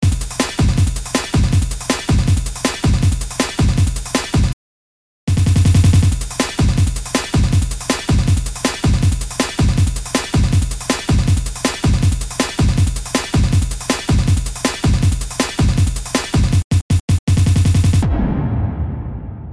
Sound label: Drums